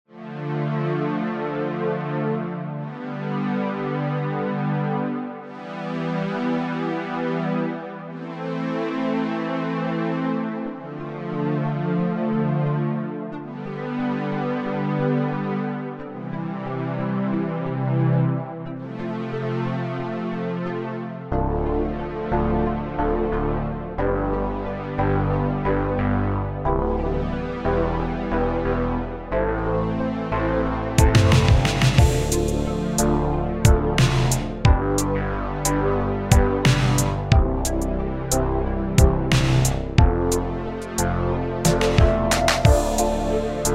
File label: slow dance; weeklybeats 2026